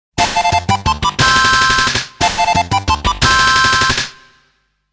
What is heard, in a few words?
Victory; starstacker